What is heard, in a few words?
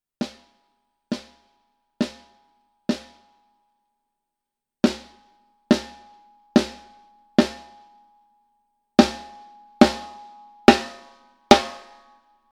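Gretsch Black Alu high, snare; Anniversery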